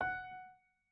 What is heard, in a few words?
piano